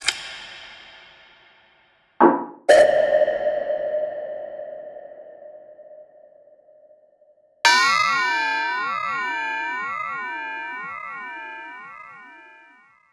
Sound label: Percs